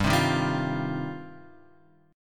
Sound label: G 7th Suspended 4th Sharp 5th G; G7sus4#5 Chord {3 3 1 0 X 1} strummed